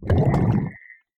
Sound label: Drown, Water; Barotrauma; Subsurface